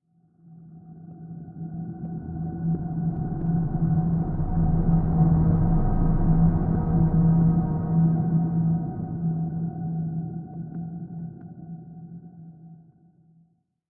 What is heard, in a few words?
music - mystery; You Are Empty